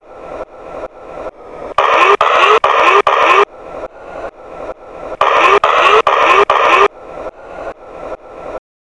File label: Dryer Mix